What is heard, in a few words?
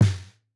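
SNARE HIGH HIGH SNARE, analog recorded drum; snare 3; Sonimus; s series drum pack; STONEQ 4K; SATSON; SATSON CS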